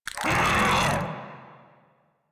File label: Desert Skate Vocal Pain D; Warframe